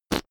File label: whoopee cushion fart short